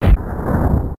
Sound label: Missile Shot; Metroid (USA)